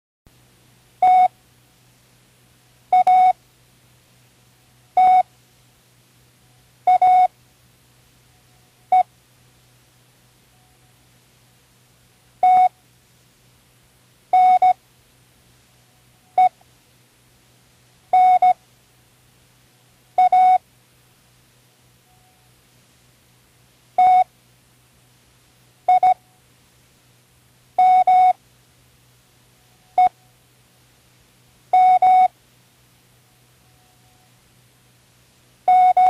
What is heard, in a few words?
random, Learning Morse Code; Tune in the World